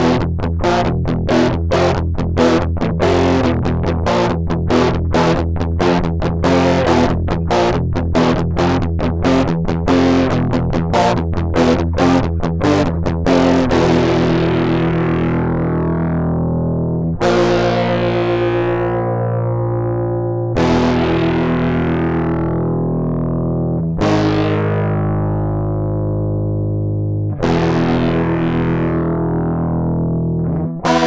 metal

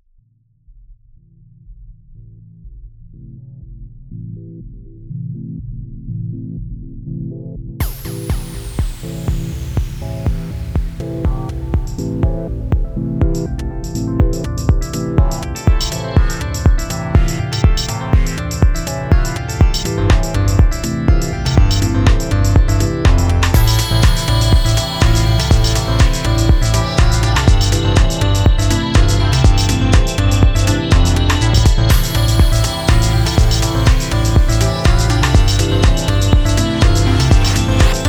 dark waves

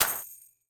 clapourine

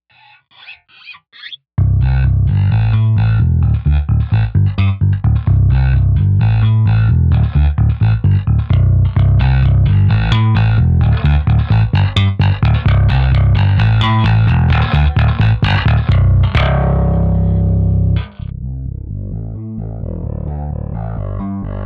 growly bass

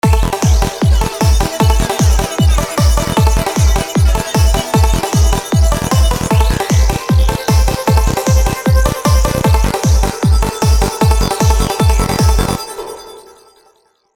classic hard trance

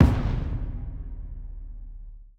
stomp; nova sound

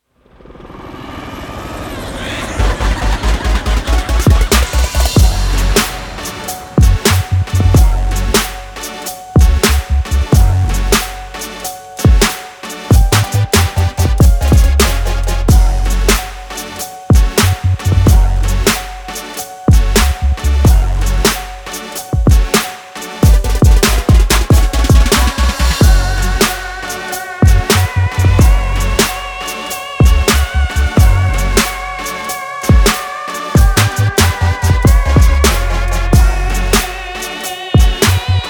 heavy hitters

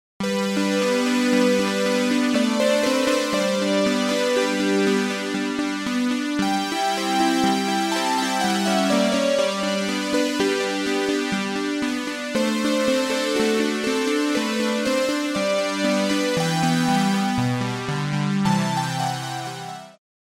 key poly brass

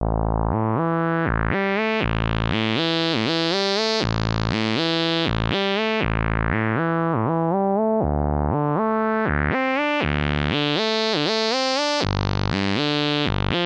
analog jitter mono

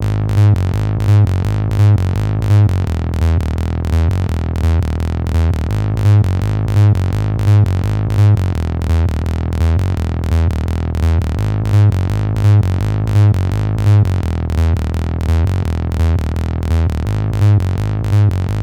bassss

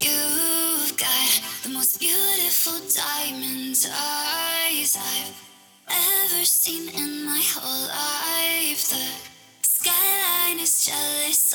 female vox de-esser pro on

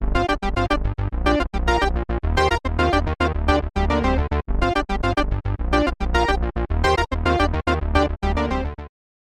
roland juno-106; bassesland

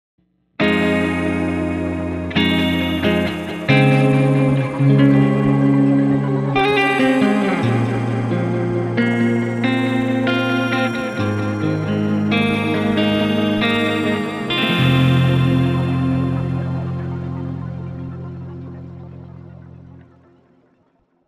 comp delay; ethria

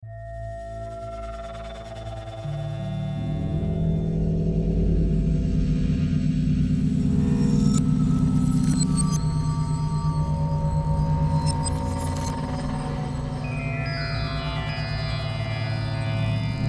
atmospheres

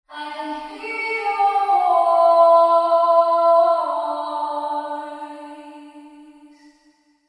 cave reverb female vocals

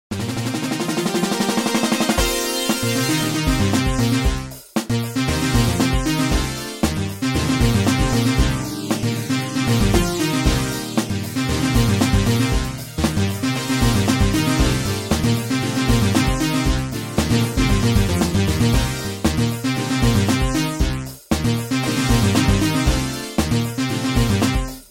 moog system 55 modular synt

